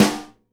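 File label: snare nylon damp, Live Snares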